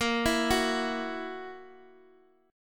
F sharp 7th, A sharp

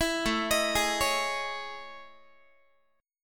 A Major Sharp 11th Guitar; AM#11 arpeggiated

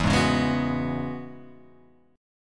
E 6th Add 9th E down

